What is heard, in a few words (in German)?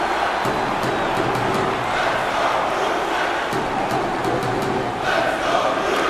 zuschauer motiviert; national hockey league 2019